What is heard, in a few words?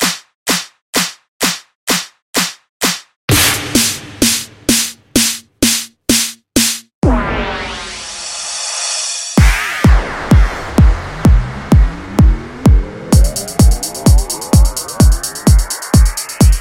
premium drum bundle audio